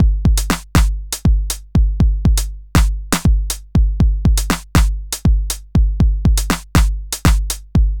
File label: Groove Pizza 120bpm